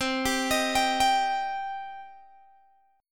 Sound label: C Minor C down Guitar Open G